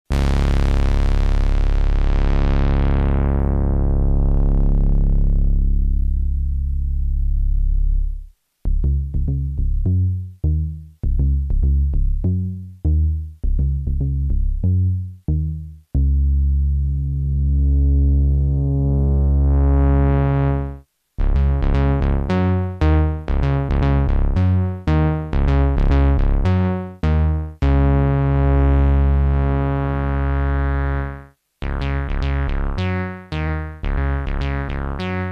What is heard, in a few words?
minimoog bass